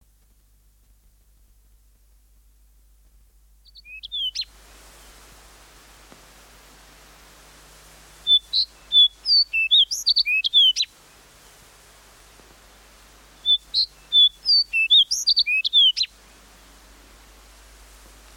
fox sparrow, songbirds

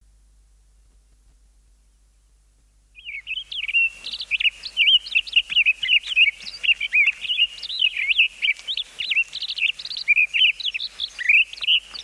townsends solitaire, songbirds

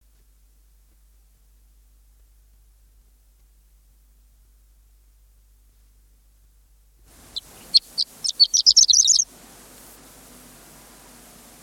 horned lark, songbirds

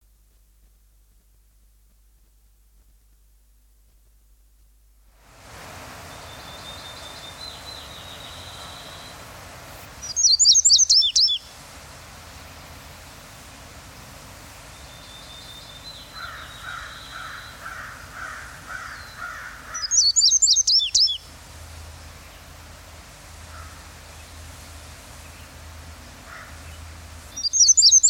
indigo bunting, songbirds

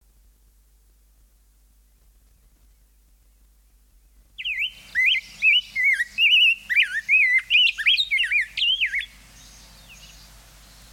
rose breasted grosbeak, songbirds

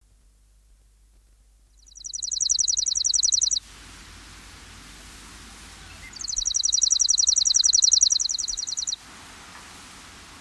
chipping sparrow, songbirds

